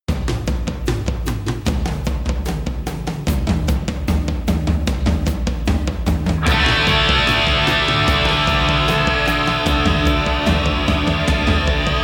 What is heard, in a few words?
Flying Guitars